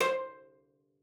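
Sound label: CELLO spiccato C5; 90 s Sample CDs; Xsample VOL-5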